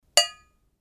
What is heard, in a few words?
UI Quirky